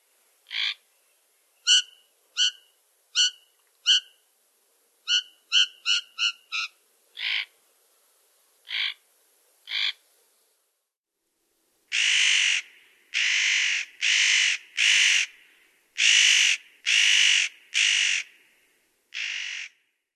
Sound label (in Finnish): pähkinähakki; janerikbruun